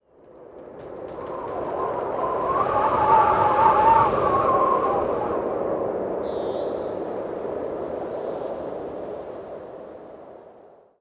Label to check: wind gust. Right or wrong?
Right